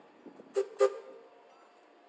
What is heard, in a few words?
train whistle depart railroad